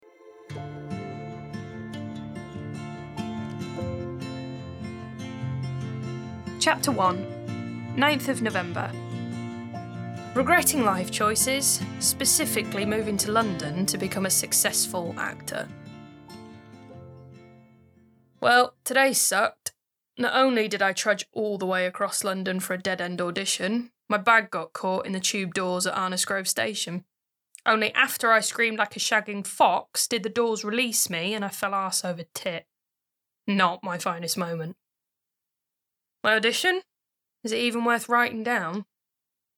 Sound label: Melodramatic